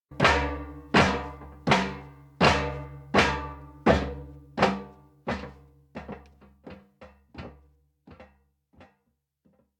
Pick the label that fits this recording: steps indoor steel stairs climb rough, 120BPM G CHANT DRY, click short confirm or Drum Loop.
steps indoor steel stairs climb rough